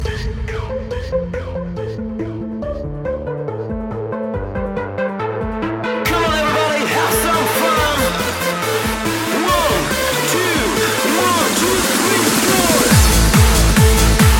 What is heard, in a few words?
Tehnodisco